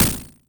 bomb boss; sonic the hedgehog

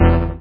locked sound; lego star wars

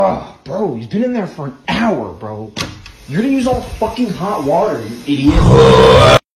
tiktok moai sound

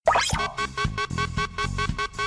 external power disconnect; evangelion

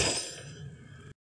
chains of hades slash; fortnite

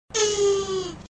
slow duck

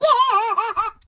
funny laughter faster

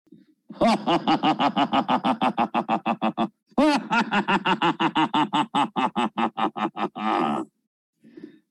creepy laugh

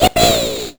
yippee; robotniks mean bean machine

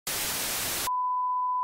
tv static and color bars transition

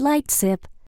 light sip

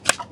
fly swatter

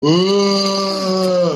mosquito

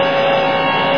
moving; r2 d2; lego star wars

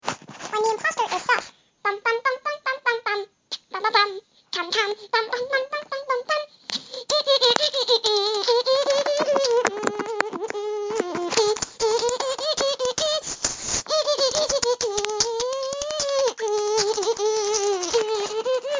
sus imposter beatbox

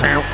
shred

